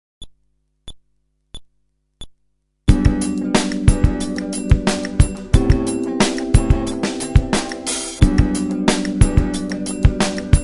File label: Groove, fretless bass